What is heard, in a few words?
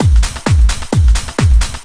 techno, DRUMLOOPS; Ueberschall; Techno Trance Essentials